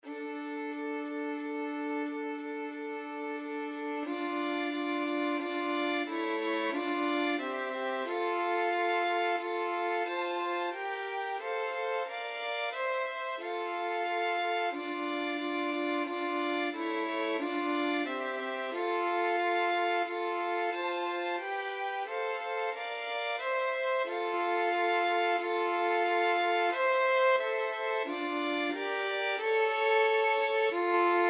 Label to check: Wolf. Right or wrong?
Wrong